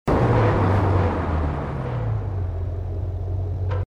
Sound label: throttle off; vehicles; impala96; TDMCarpack; TDMCars